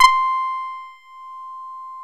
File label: SH PADS; Pro-Rec - Synthsonic; JV PADS